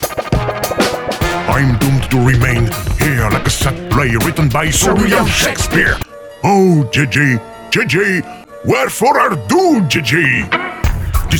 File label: Music Rap Panel Off; There Is No Game: Wrong Dimension